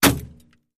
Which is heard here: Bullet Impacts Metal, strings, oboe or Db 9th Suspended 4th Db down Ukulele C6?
Bullet Impacts Metal